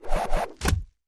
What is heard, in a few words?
FLESH CHOP, gore